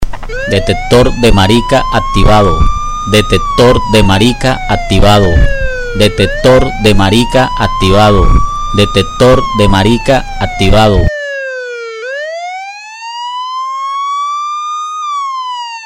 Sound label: Detector